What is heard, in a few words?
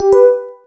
charging; Linux